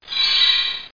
BACK RING; Ah My Goddess; stage; spin

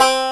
banjo; cubic

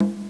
Melo Tom; Castles - Siege and Conquest; Castles Demo

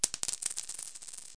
drop tiny; Wired for Sound Pro CD; Aristosoft, Inc.

